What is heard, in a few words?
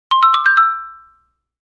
notification